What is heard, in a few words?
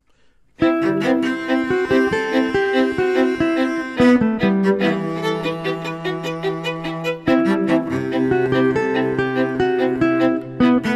Gtr Vln Clo